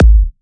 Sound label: 128BPM Kick; Vengeance Samples; VFH1 128BPM Roboto Kit